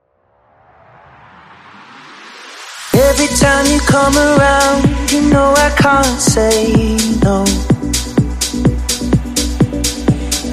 Top40 Groove House, No Beat

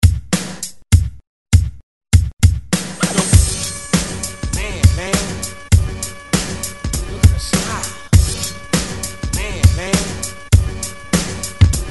ReDrum 100 Bpm; DJDOLLS

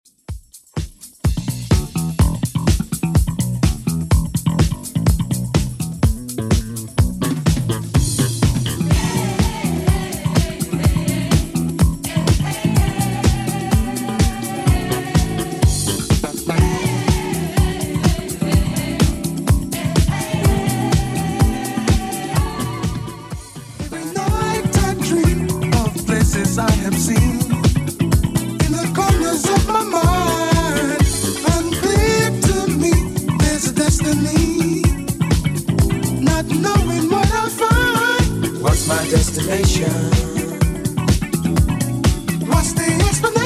80s Redrum 125 bpm CLEAN

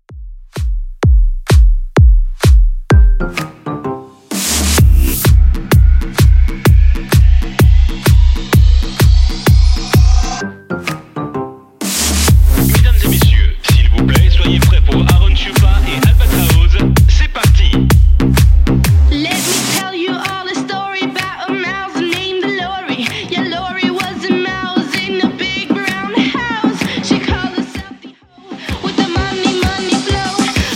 2ks Redrum 128 bpm

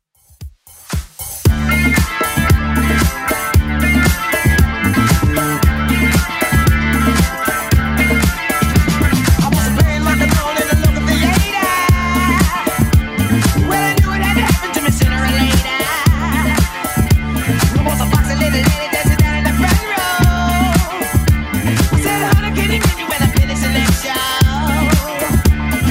80s Club 115 bpm CLEAN

